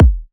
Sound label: kick